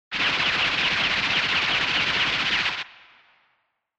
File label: Retro Anime Gun Mid